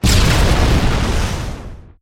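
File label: grenade explode; star-wars-rebels-team-tactis; sabine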